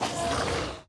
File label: struggle voice